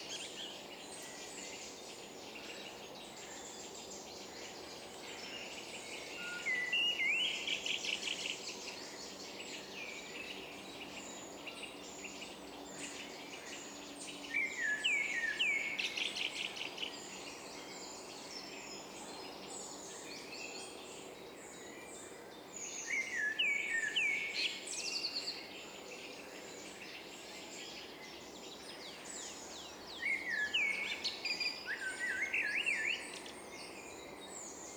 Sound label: ambient forest; Terrarum